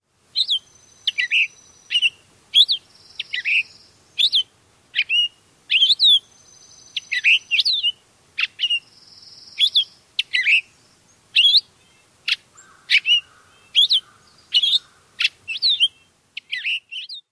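vireo-like song given in presence of a hawk, Purple Finch; NY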